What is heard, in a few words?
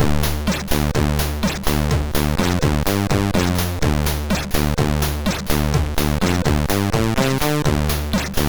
Short Tune sid MOS6581R3